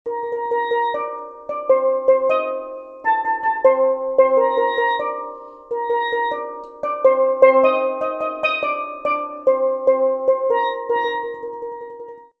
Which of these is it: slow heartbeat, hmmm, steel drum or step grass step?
steel drum